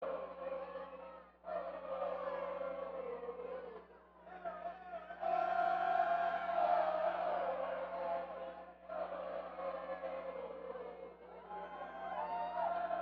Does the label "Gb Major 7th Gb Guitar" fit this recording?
No